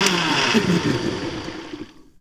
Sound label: BLENDER OF, Kitchen Counter; SFX; E-MU Producer Series Vol. 2; More Studio Essentials